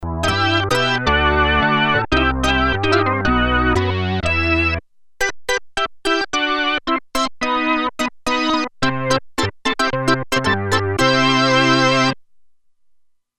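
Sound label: Synth Farfisa, Roland Alpha Juno-2; Factory 2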